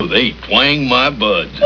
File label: twang; Darlings